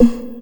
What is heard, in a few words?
Talky percus, EMU Orbit-3 drum machine; 300 Drum Machines